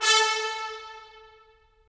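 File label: brass trumpets staccato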